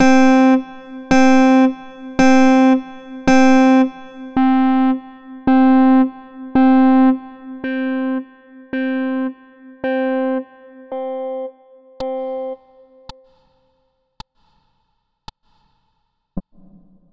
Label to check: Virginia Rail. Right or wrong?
Wrong